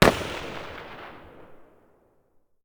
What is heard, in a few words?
M4A1 muzzle mono far